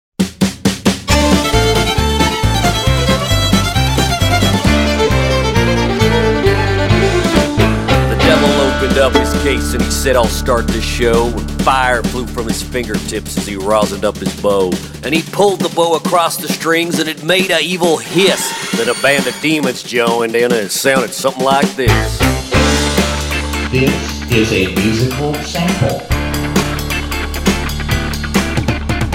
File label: Jazz Dance; sound alike tracks